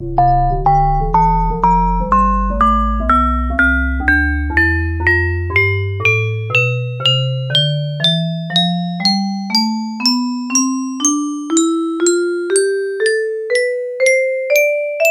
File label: music box tonleiter samples